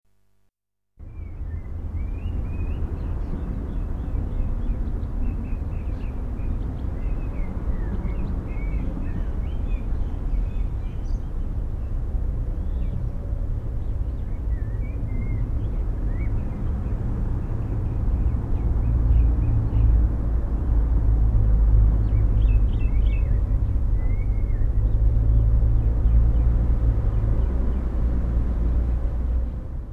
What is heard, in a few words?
merri birds cars; Provenance 2007